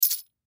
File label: Tambourine Wood; Tambourine Wood Hit Shake; Rattle; Blastwave; Sound Effects:Musical